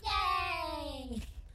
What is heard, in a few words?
yay